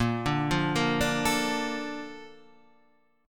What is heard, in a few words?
Bb Major 7th, Guitar, Half Step Down